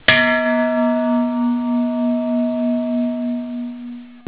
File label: bell